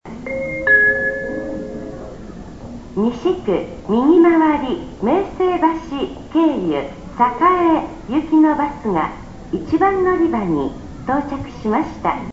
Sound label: west clockwise, orgel; oasys21